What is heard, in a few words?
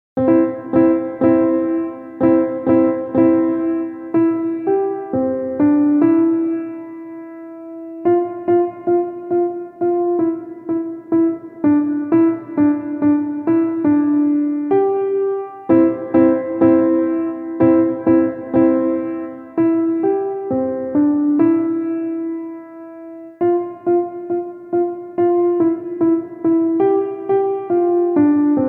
Jingle Bells